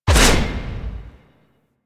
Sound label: Melee Heavy Attack Short Blade Whoosh; Warframe; Weapons; Whooshes